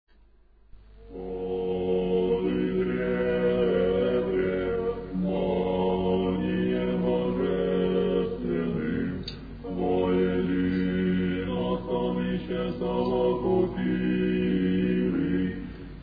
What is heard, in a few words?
irmos; octoechos